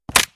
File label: tool breaks; minetest; capturetheflag